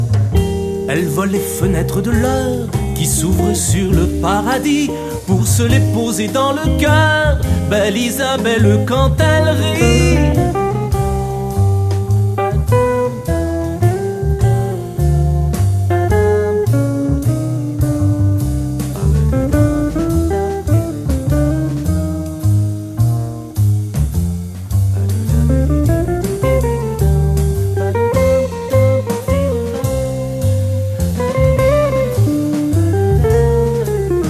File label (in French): solo